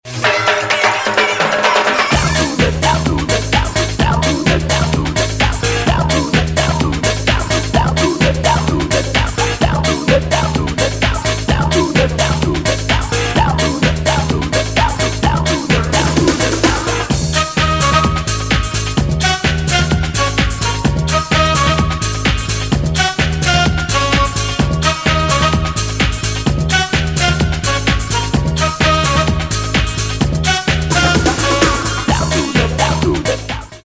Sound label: Scat and Sax Frenzy